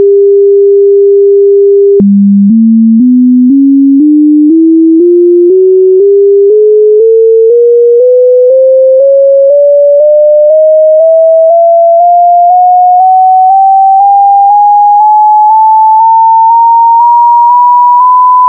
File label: cover Vibration Test; xiaomi rodin